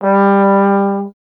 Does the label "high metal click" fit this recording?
No